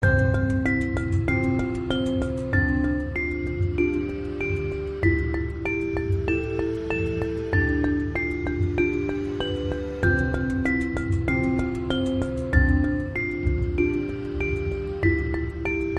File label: Thoughtful Marimba